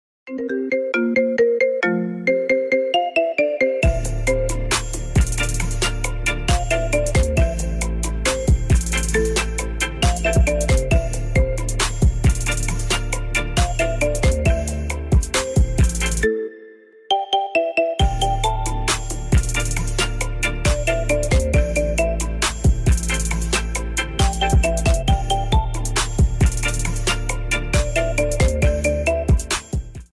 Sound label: Marimba